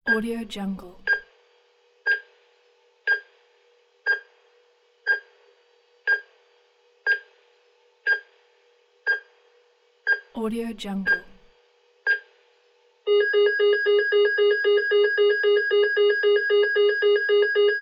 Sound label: heart monitor beep